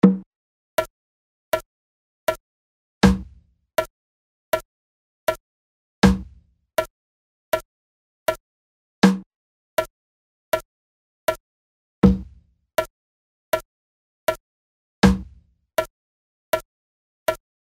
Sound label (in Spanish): Ejercicio1 de polirritmia redonda